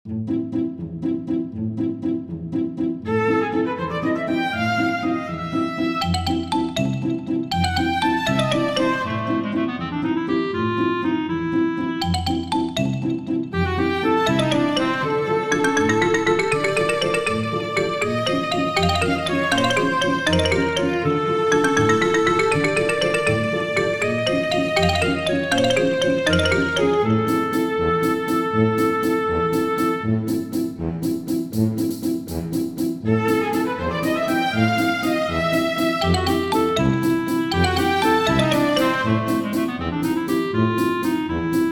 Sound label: Bone Yard Waltz - Loopable